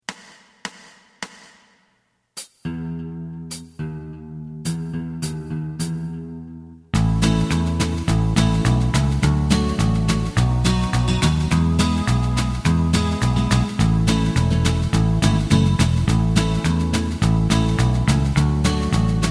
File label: lead